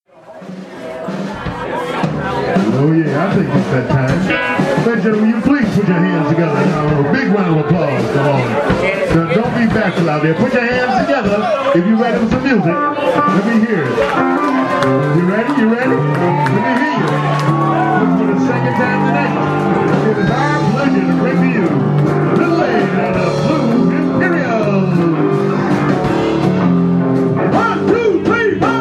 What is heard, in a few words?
blues